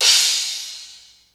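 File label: crash; xr10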